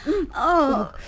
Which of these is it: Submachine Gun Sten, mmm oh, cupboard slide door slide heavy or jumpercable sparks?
mmm oh